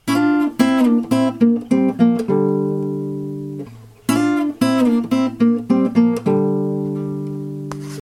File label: e shape minor embellishment lick